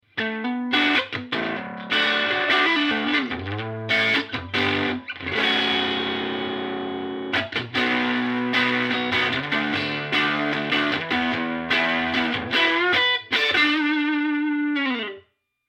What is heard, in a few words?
overdriven